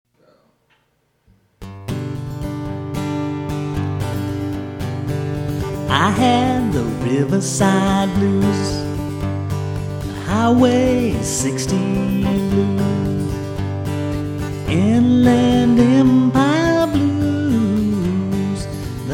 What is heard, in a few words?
blues